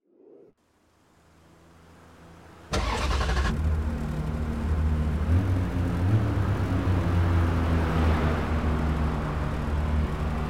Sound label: ferrari 360 challenge stradale